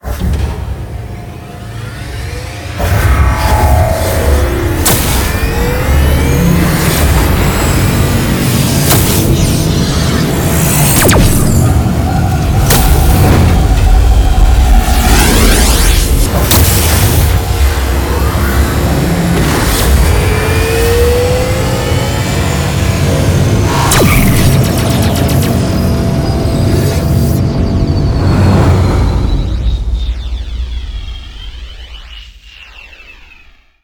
wormhole; ships movement

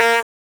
honk; Cosmic Rage

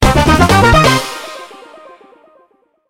raise, level; CosmicRage

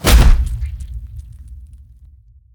flesh; CosmicRage; combat; bigtail

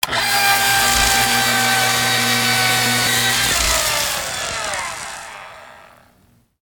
laser, hand mining; Cosmic Rage

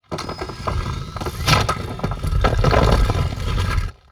unstable, volcano Harvesting

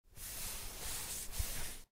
ruffle

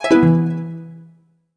blueprint

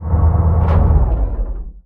volcano transfer end; volcanoharvesting

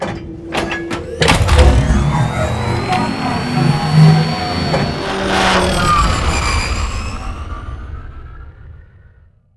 vehicle launch; CosmicRage

